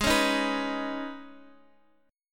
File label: Eb 7th Suspended 4th Sharp 5th Ab